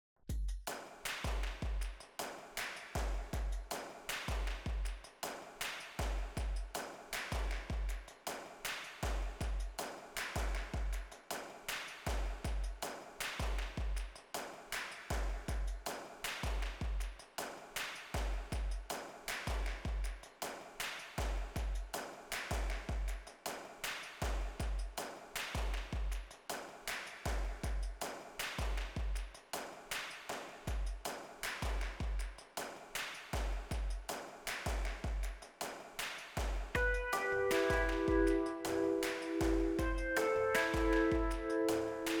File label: perc, rhodes